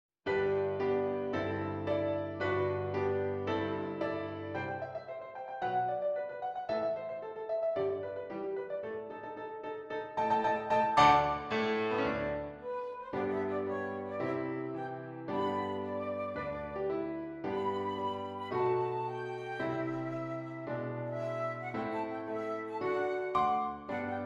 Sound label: Flute and Piano